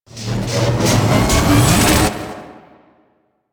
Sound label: Death Beam Charge Up; Warframe; Corpus Gas City; Boss Arena